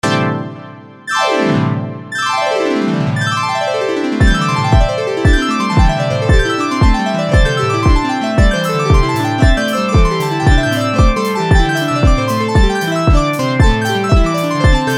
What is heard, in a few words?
polyrhythms